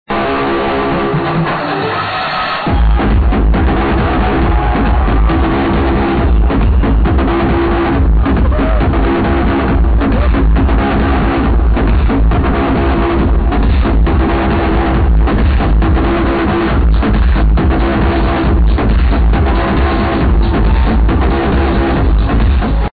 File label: trance; this song was played in the trance energy 2007